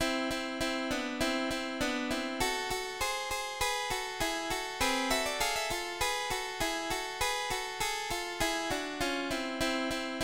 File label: gpt2 midi pop midi